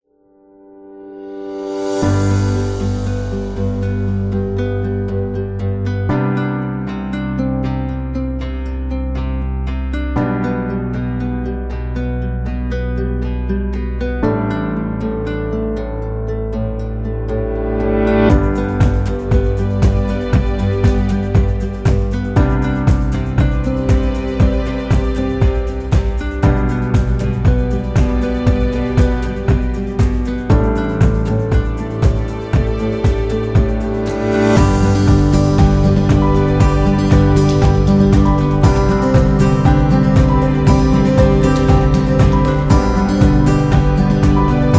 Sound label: Family Slideshow